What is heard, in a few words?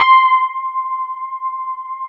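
Fender Rhodes C5, Keyboards Old School; USB Soundscan vol.09; AKAI; FEND.ROAD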